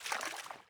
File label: drop water; Rust